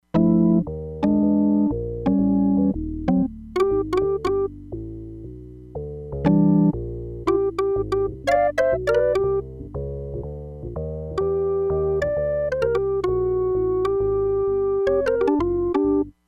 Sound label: ORGAN BASS - JAZZ ORGAN, Crumar Bit 99; Factory Programs